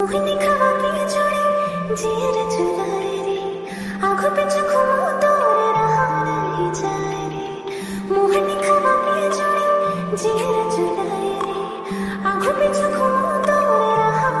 Cg Odia Song Ringtone

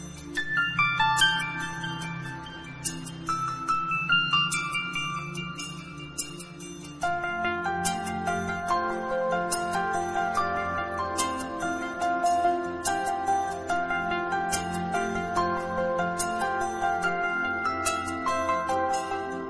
Romantic Music Ringtone; ringtones

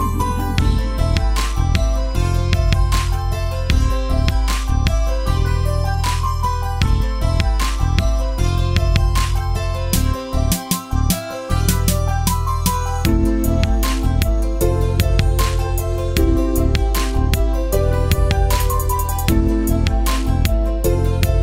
Instrumental Song Ringtone

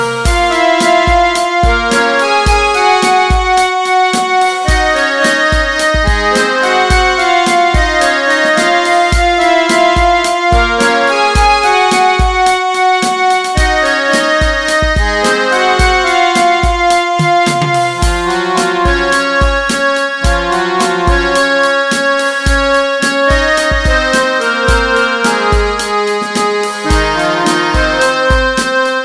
Instrumental Ringtone

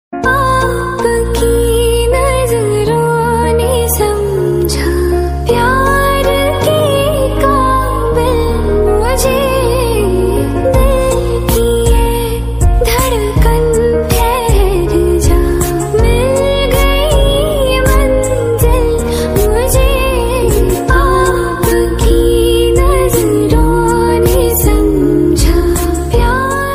Romantic Love Song Ringtone

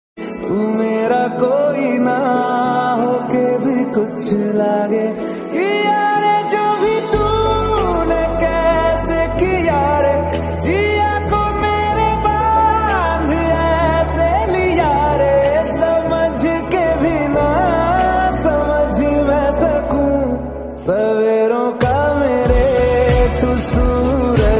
Romantic

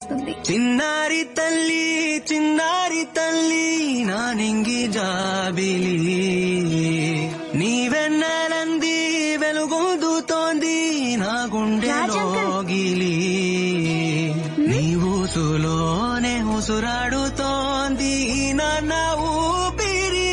Father And Daughter South Indian Song Ringtone

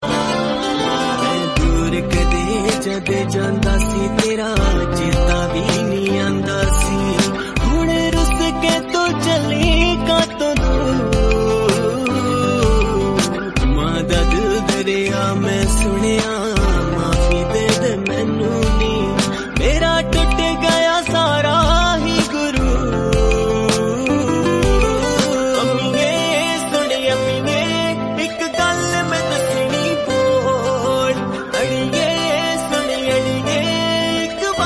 Punjabi Sad Song; Ringtone

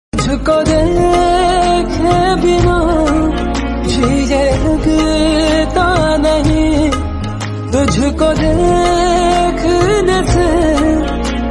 Bollywood Song Ringtone

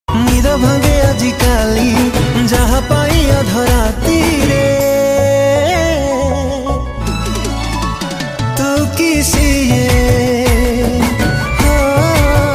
New Odia Song

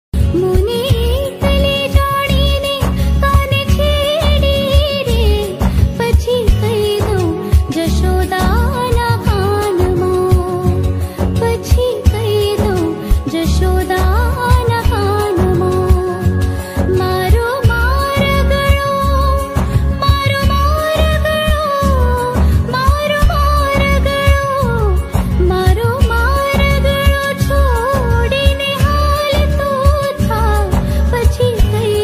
Gujarati Ringtone

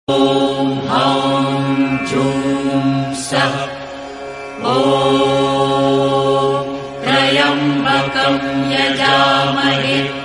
Maha Mrutunjay Jaap Ringtone

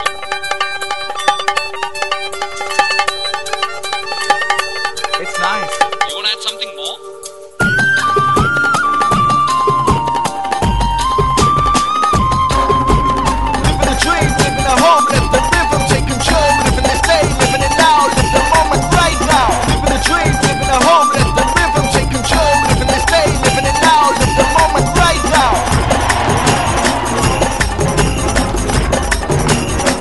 Arabic Music Ringtone; Airtel

